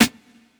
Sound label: Snare Hit; Hip Hop Exotica; big fish audio; 100 Dmin